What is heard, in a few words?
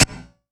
Yamaha CS1x Drums